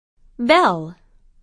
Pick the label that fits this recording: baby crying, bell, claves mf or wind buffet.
bell